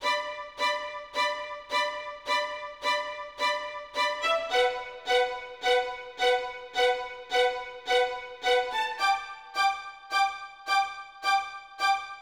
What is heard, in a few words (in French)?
tentatives orchestrale